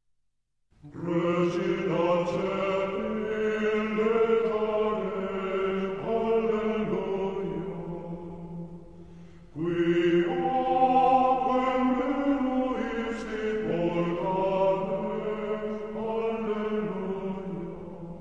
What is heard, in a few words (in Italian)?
regina caeli, coro